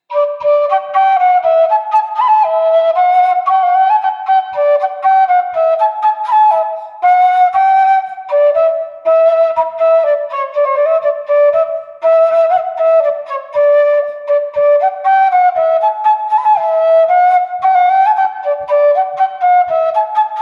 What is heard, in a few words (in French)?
Bourrée d'Auvergne; Recueil De Laborde